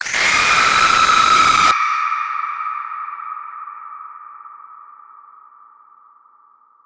aaa only

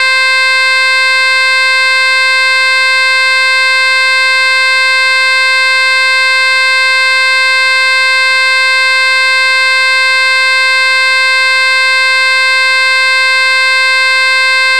Ring bell; 音MAD; 蓝蓝路

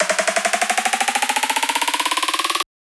snare1

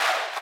crowd

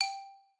xylo

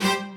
strings

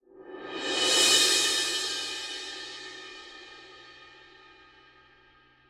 orch cymbal

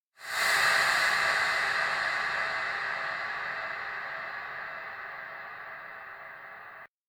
bgm

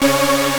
synth

